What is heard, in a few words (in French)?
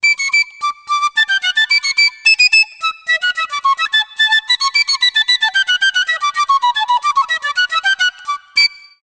Flute piccolo